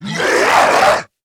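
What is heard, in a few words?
attack, zombie male; nmr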